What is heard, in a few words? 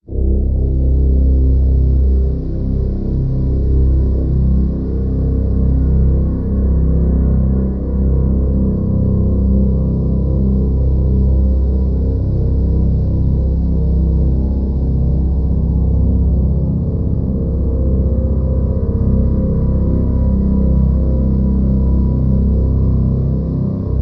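drone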